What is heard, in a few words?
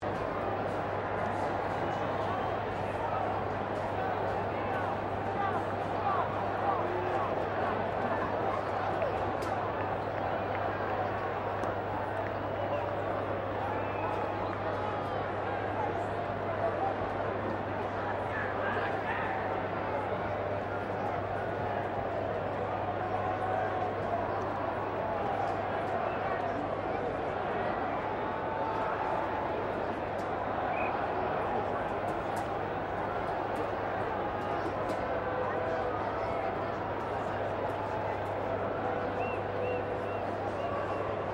Crowd 40K ambience low, Cricket at Melbourne Cricket Ground; Mcg; Backgrounds; Crowds